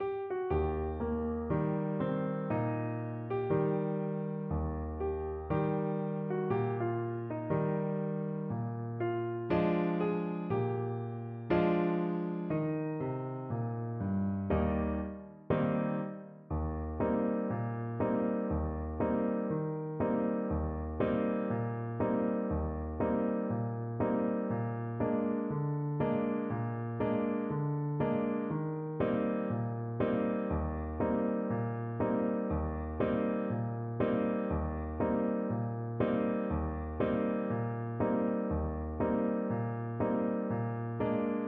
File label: down by the riverside, trumpet